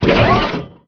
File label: sonic tank deploy; Emperor - Battle for Dune